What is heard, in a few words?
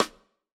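Snare Stick, drum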